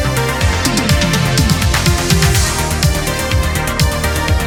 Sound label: synthwave